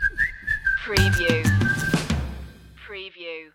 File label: whistle beat stinger